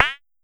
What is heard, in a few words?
Pollard Perc, Syndrum